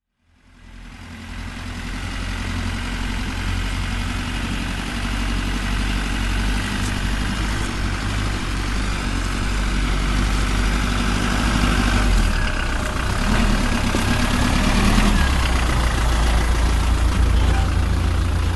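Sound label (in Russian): zvuki avtomobilja, car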